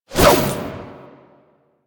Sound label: Jump Whoosh